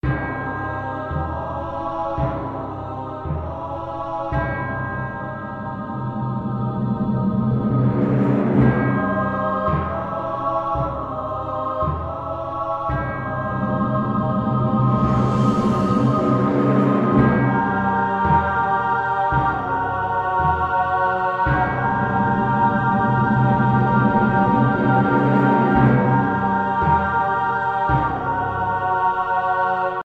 Shipwreck Storm; Drawn Dark Flight

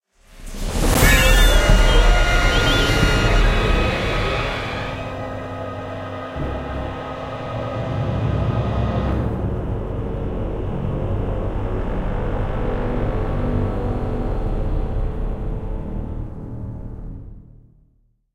Action Sting; Jurassic Park The Game

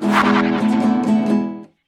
Mariachi Guitar strum; WrestleQuest

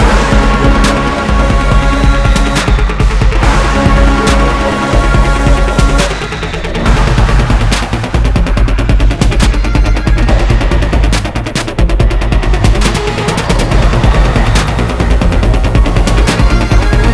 BOSS BEAT; high hell

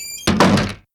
bar close; Toy Story Mania

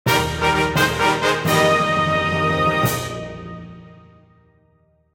Win Fanfare Sound; Taco Tom 2; macOS PS4 PS5 Switch Windows Xbox One Xbox Series XS

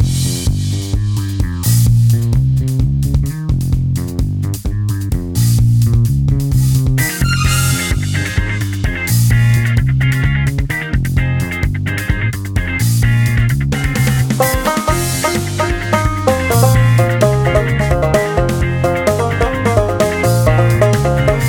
Disco; west of loathing; Cave Lounge

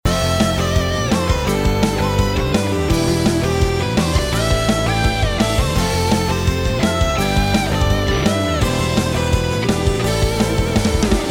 sing

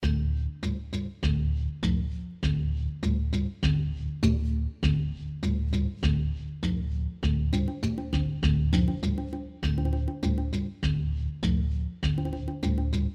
dungeon with bells; windscape switch windows xbox one gamerip 2016

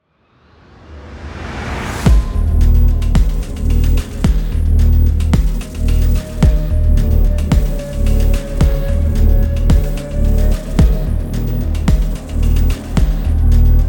Hell Swamp Music Main Ambient; Dlc1 Hell Swamp Music Main Ambient 11

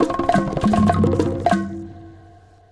mariachi drums; WrestleQuest

